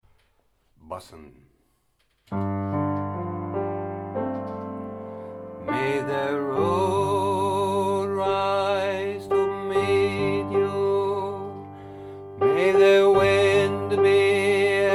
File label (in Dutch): Irish blessing bassen